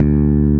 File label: bass